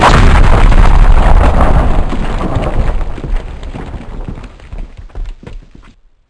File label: explode slow